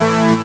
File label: CHORD; jazz jackrabbit 2; M8